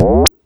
Minimal Perc; Minimal Percussion

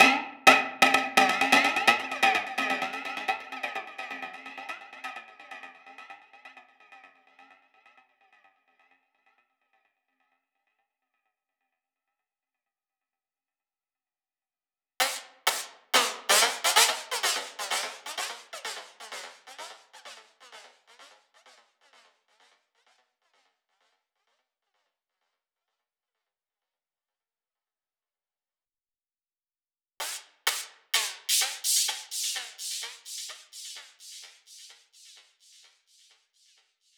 FX Percussion, 128 BPM; Vengeance Samples; VTDS2 Song Kits

